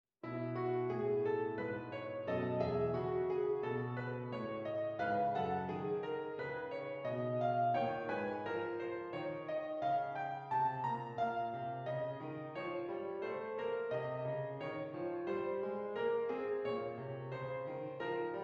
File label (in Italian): Adagio